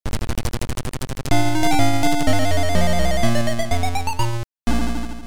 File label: Level Complete; Sheepish - 6 - Level Complete